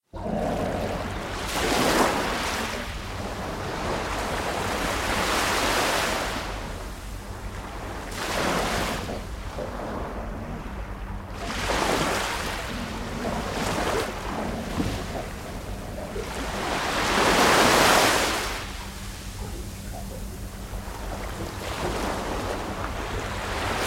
Fight background

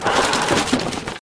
Plants hide

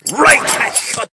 Lighter shoot

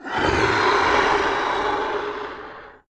Plants shoot

